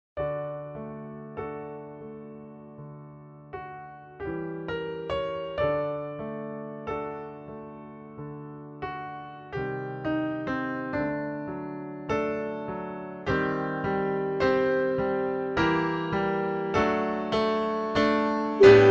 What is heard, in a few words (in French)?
MEZZO